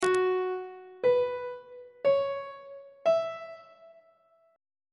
g flat dominant 7th suspended 4th chord intervals on treble clef